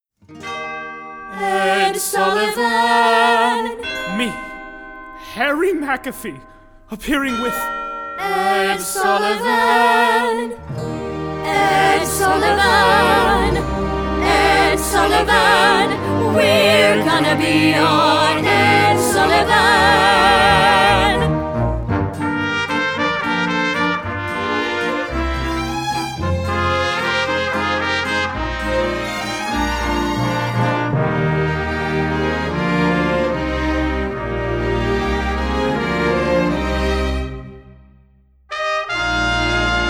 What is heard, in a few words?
Vocal Guide